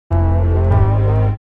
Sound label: house synth 125 A, keys